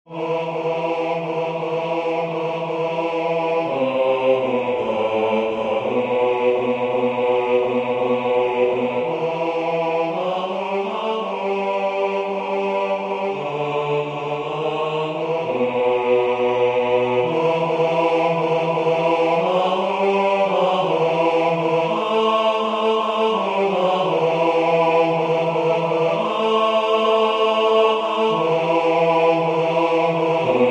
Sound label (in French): Vous êtes dans mon âme basse